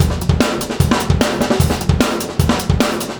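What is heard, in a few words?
150 bpm, beat loops; Zero-G; Monster Beats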